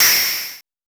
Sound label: MOOG HAT; AMG - Vince Clarke Lucky Bastard